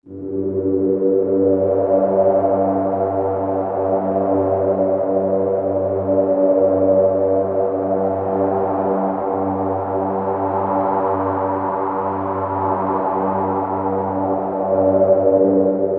NRG Pad

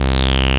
BASS, Analogon; A-BASS 2

